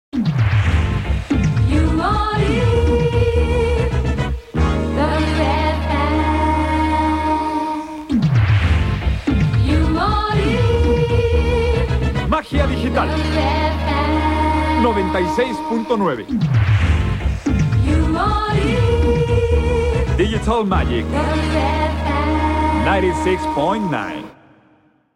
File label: Jingle, radio